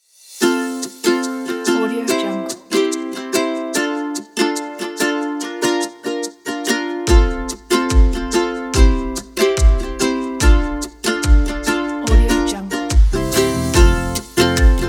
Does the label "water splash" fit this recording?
No